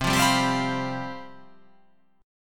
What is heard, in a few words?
C Major 7th Suspended 4th, down